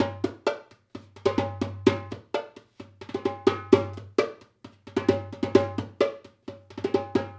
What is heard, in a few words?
Dumbek; Drums; Essential Percussion